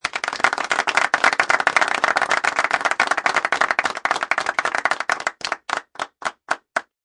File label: Crowd Clapping, Small crowd applause, Studio audience clapping.; Human